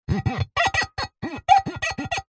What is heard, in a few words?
foley glass jug thick finger rub squeaks